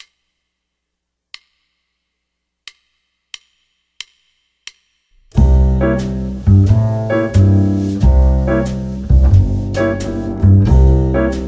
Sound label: tenor audio stretched, saxophone